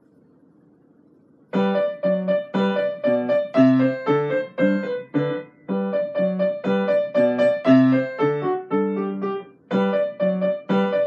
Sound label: Ukrainian Folk Song; op 107 no. 3; Ludwig van Beethoven